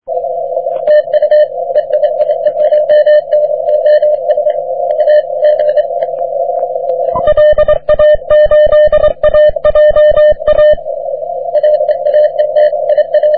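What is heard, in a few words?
40m continuous wave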